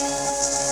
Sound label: FX Synth Lofi Chop; FX Hit; Drums; Ableton Live 12 Lite; Core Library